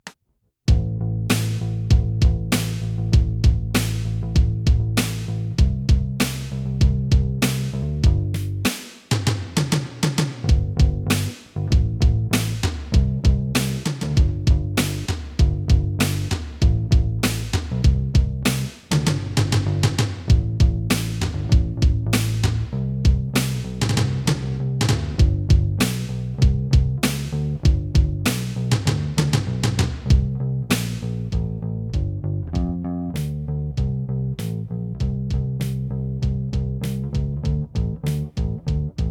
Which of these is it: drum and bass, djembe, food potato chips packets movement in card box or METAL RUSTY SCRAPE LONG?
drum and bass